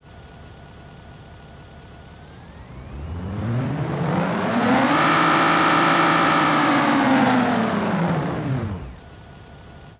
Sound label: sports, engine sound